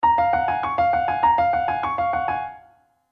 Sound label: PIANO LOOP